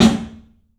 club snare, 3D Drums; 3D Sound

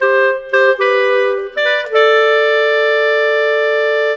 Clarinet; Sonic Foundry (Sony Creative Software); Orchestral Series VOL-4; Rock and Pop; Rock-Pop 03

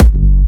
Jump Kicks, Bassdrums

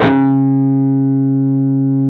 PRS PWR D3D, Guitars; PRS PWR CUTS

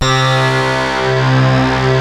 DRONE, ENSONIQ VFX; Rubber Chicken Software Sample Bank

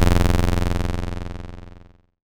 BASS SH09 F0, Synths, Roland SH-09, Bass

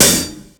Hat, breakcore Drum Hits

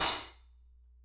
Soft Xtra Dark Long, Hats; Airwindows

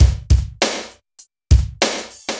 Ambience, BPM100

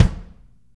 Room Kick, Room Drums; Akai MPC500

